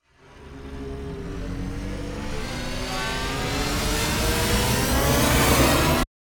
Metal wires intense riser

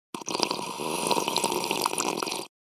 Potion drink

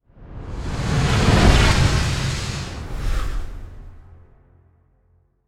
Intense powerful whoosh transition

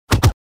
Fast swooshy punches

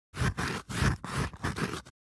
Writing with marker on cardboard

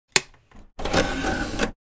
DVD player tray open or close

